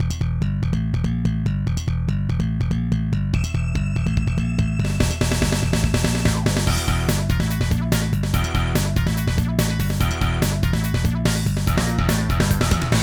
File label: Rock Stage Karaoke; Pinky Street Kira Kira Music Hour Pop Princess; Rock Stage 2